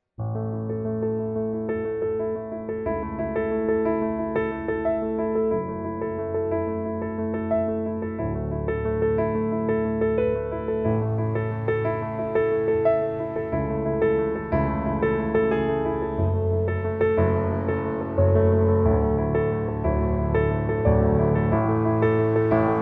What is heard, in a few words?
romantic love music wedding background intro theme